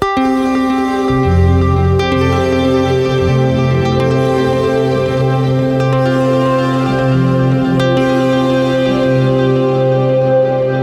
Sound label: synthesizer; Patrick Matteis